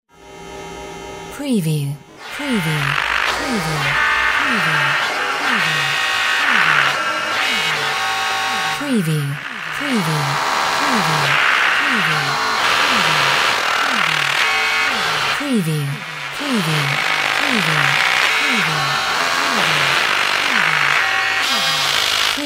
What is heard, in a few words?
SCIFI MECHBOT CONFUSE